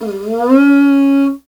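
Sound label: Afro Horn Phrase; Roland LCDP11 Africa VOL-1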